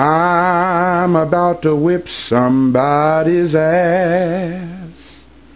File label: whip